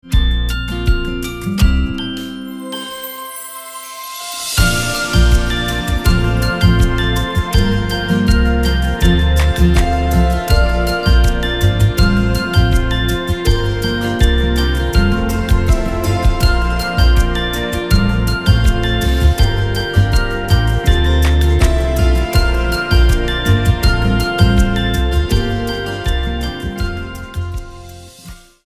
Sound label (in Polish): podklad, wersja instrumentalna